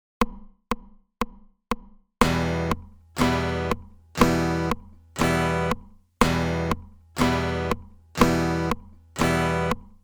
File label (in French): Couper le son